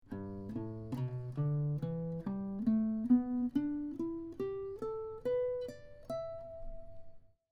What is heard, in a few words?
Connecting shape 2, E minor pentatonic scale, guitar; Minor pentatonic scale connecting shape 2 with tabs example